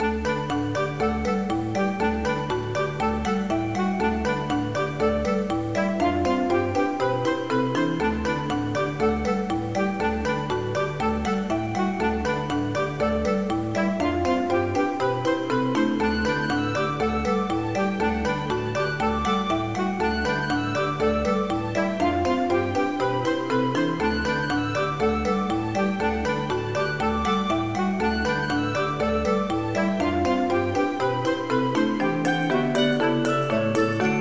system bgm; system bgm 01

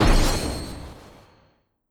Skel Drake Spectral

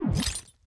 Item Collect

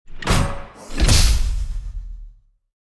Fraud Charge

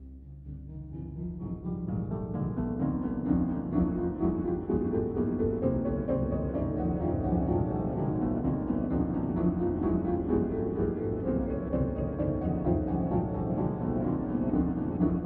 Music Gold Room; Music Gold Room Castleboard version

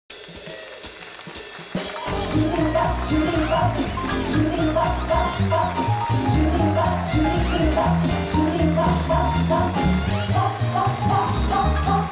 Mission Passed!; Smash Court 3; PS1

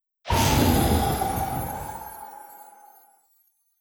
Yeti Breeze Spell; Legend of Keepers

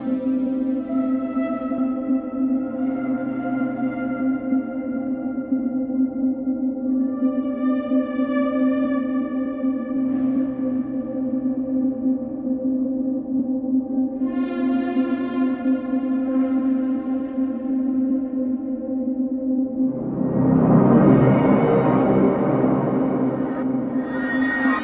Roof No Romance 22 Bars 155 Bpm

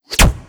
Turrets; Legend of Keepers (gamerip) (2019); Turrets S W Prep

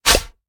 Blow Pipe; gamerip; BlowPipeAssassin

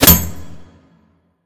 Hit; Sarco

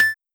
Block perfect; Syrup 2 Candy Alchemy RPG

